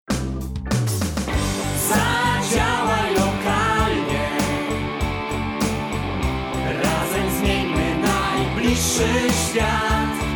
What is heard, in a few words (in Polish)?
Piosenka, dzwonek; zaDzialaj Lokalnie